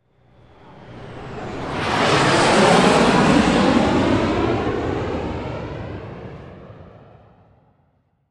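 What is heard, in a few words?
Plane Airplane DH.110 Sea Vixen drop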